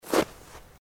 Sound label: footsteps snow